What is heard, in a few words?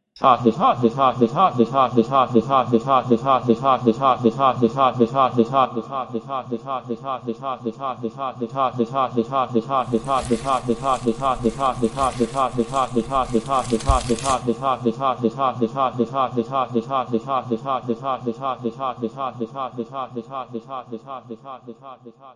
the most awkward laugh you ever heard